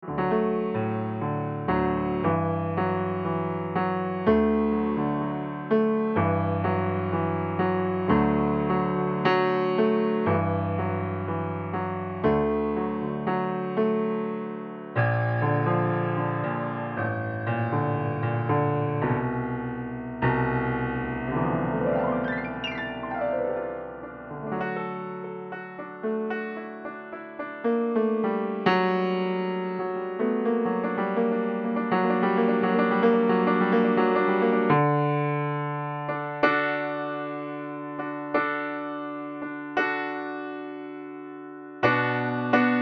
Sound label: instrumentals; Who am I, some kind of Gershwin who actually can't play piano and is really kind getting frustrated with that inability? (2012)